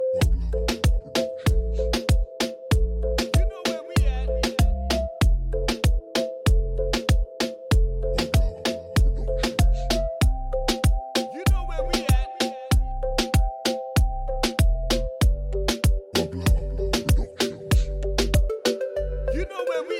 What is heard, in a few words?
Latin Reggaeton